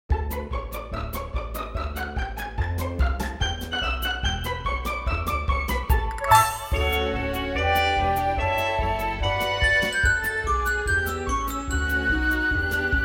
Pizzicato Parody

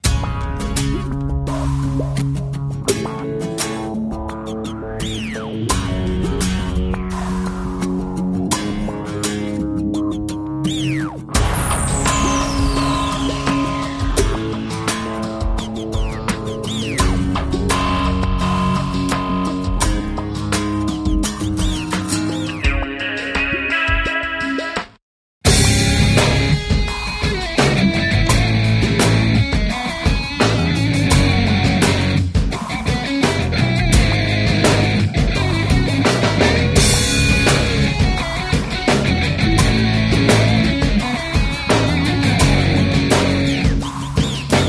Drama Rock

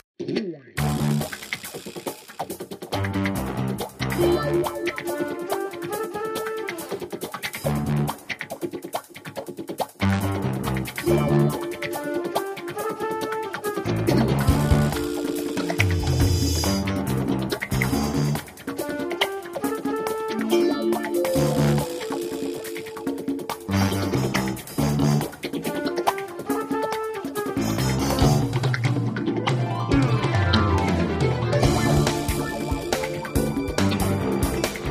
LOVE ORCHESTRA, Disco Cues; Cue Palettes

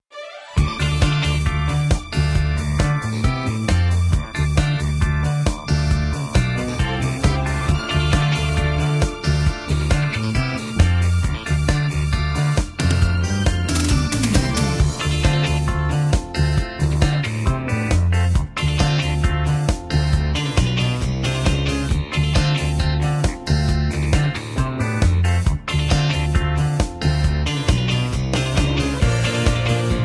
LOVE ORCHESTRA, Disco Cues; Cue Palettes

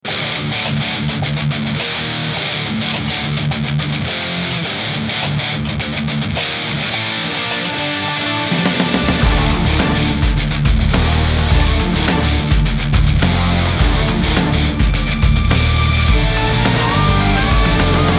Metal Orchestral; Cue Palettes; Cues on Steriods

